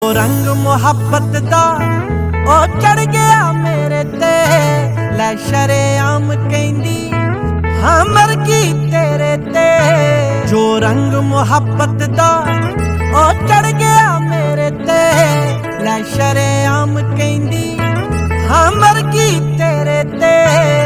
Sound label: Ringtone